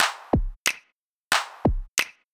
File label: rhythm